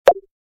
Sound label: feed no